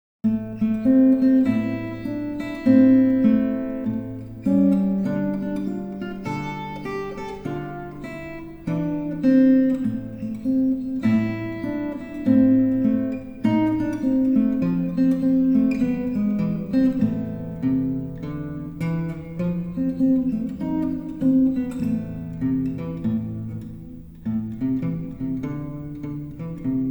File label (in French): blues en la mineur